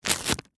Masking Tape Peel, Industry, Masking, Tape, Peel, Off; Offices